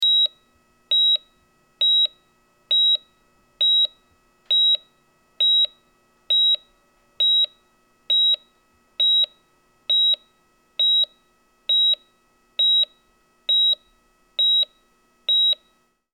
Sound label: Hospital Heart Monitor